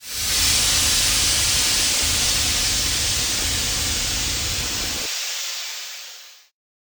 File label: Steam release, gas